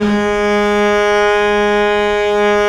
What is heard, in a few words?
VL G#2, VIOLE, Historical Instruments; USB Soundscan vol.23